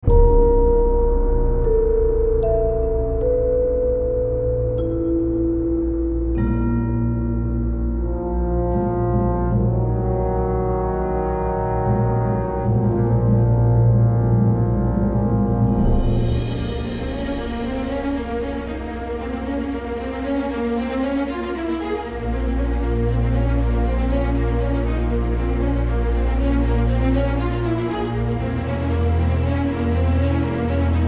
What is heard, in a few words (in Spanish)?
orquestal misterio accion